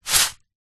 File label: epsom salt in card box movement shake; foley